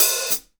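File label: hat open close, drum; 90s sample CD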